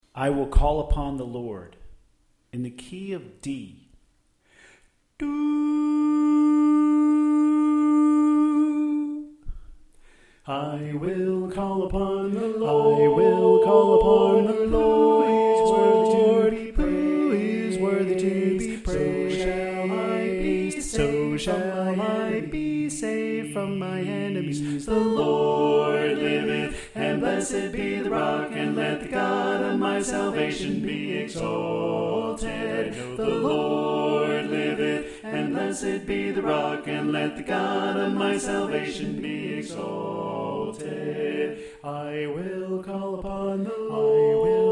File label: Alto Left